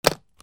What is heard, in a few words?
OFFICE